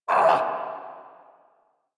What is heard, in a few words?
Pain Lich A